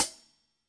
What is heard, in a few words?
real Hi Hat; REAL LIVE KITS; A Real Freakin Drum Kit